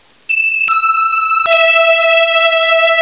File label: Low strings; Amiga Format CD